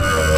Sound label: Scrape C4; Digital Sound Factory Xtreme Lead